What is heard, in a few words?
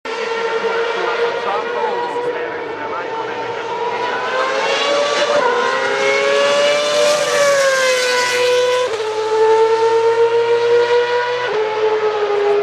Formula 1 F1 Cars PURE V8 Engine SOUND